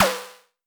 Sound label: Tempest Analog Snare, Drum Machines; 300 Drum Machines; Dave Smith Instruments